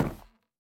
錾制书架 踩踏声

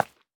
蜡烛破坏声

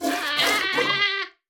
山羊尖叫挤奶声

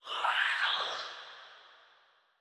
灵魂疾行声

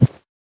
收纳袋插入失败声

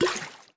玻璃瓶倒空声

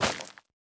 下界疣破坏声

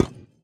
饰纹陶罐插入失败声

